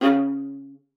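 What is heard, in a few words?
C#3, viola spiccato; Xsample VOL-5; 90 s Sample CDs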